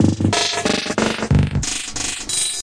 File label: DRUM LOOPS and BREAKS, 184 bpm; 181 bpm plus